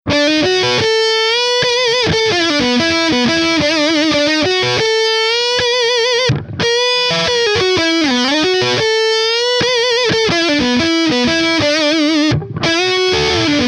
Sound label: Woman Tone Gibson; TH30H